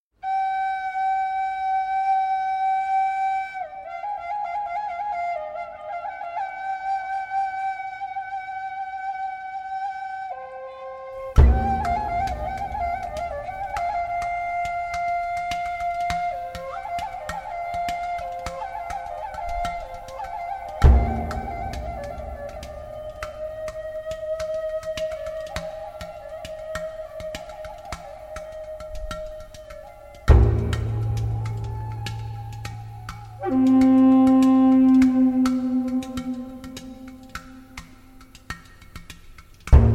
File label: Flute